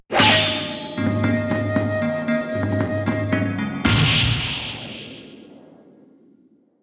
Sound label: Stinger